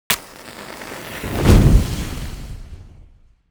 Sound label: big fire spell burning; atash